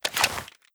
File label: pistol deploy